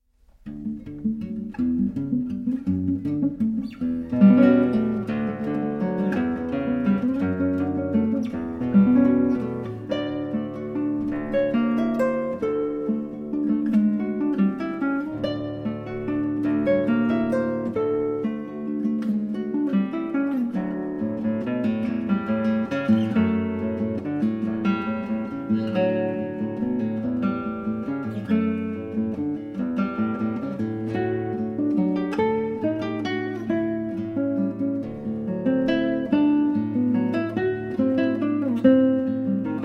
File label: Danse Rythmique, Guitarra; Ida Presti